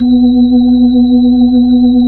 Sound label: B-3 Chorus